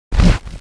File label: Bone Dragon Move Sound; Heroes3